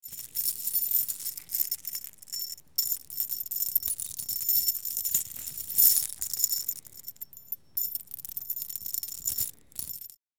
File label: Tiny chain movement and rattle